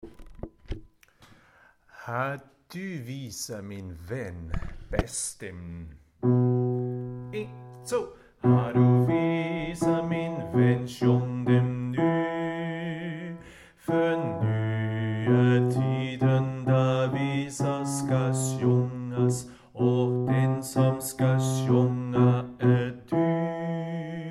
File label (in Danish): bas